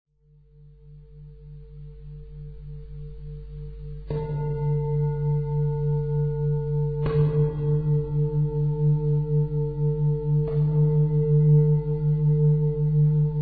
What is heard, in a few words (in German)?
Dreiklangschale